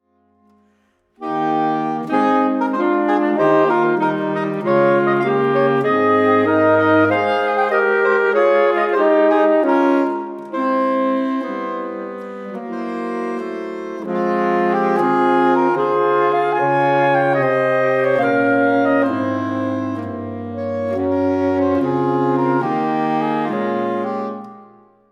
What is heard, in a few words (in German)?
PLAYFUL Es war einmal, saxophonquartett